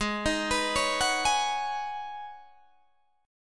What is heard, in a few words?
G sharp Minor 11th G sharp Guitar DADGAD, chord